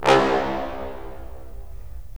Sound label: BRS, Roland LA Composer